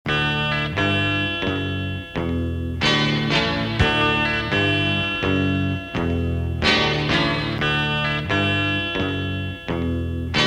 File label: major chord